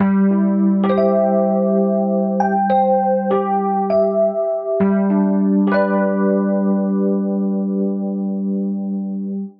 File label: Army EP, metal